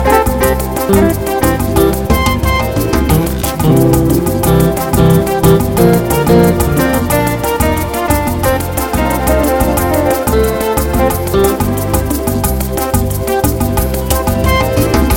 Fast Beat Groove

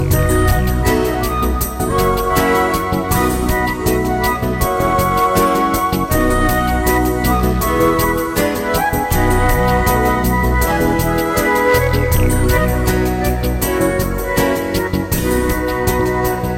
A Kool Beat

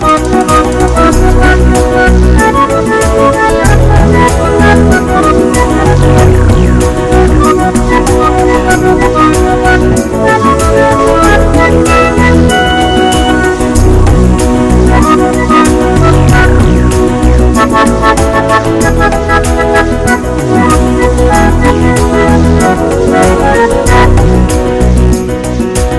A Pretty Ballad

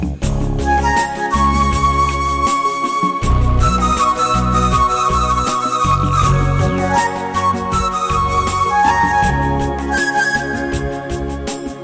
Slow but Hot Beat

